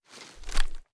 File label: kilo98 inspect; viper